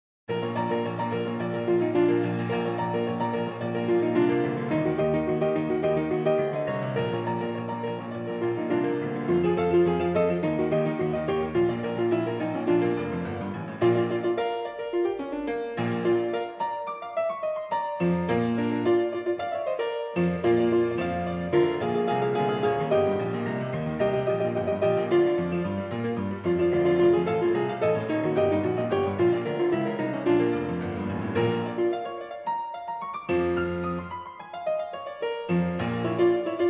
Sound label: Rock, Grand Piano